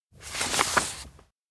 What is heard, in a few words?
paper pass; anemoi